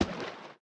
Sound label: landfill inventory move; factorio 2.0.55